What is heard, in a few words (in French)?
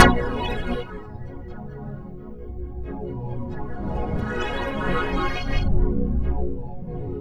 ORGANE; USB Soundscan vol.33; AKAI; Disjointed Textures